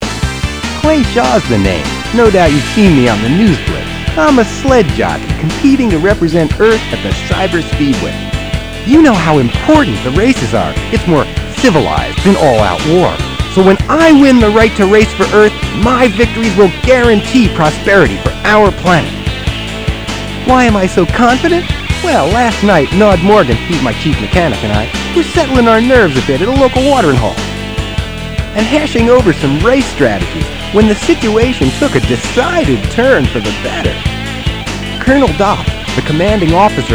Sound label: Guitarist; Cyber Speedway; Gran Chaser